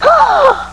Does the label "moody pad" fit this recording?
No